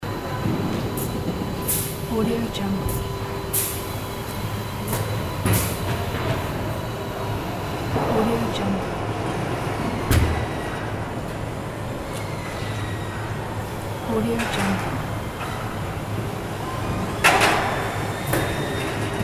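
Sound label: train repair depot interior loop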